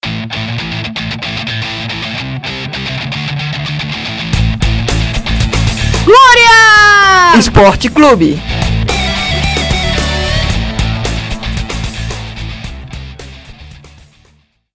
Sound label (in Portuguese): vinheta; gloria esporte clube